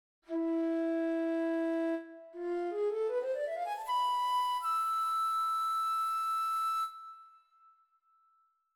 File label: bansuri; world music